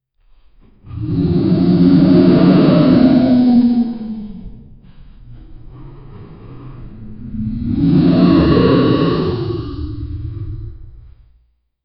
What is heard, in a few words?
real t rex roar; dinosaurs